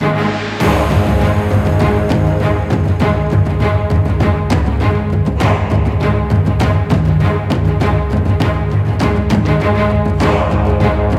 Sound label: Battle, Intensity loop, High; davyria heroes of eternity windows gamerip 2017; Battle In Symphony